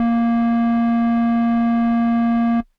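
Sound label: Air Bass Kawaii A#1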